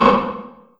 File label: LARGE ROOM 909, REVRB; TR 909